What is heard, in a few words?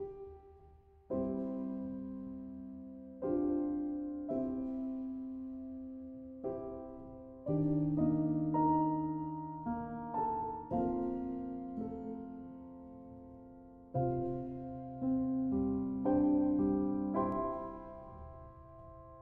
World Music